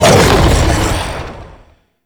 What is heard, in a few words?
Howl Run Start; Warframe; Voruna